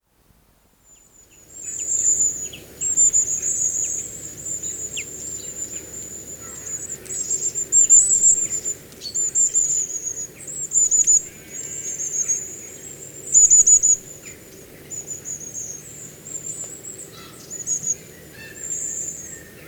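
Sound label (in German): Schwanzmeise Gesang, Vögel in Europa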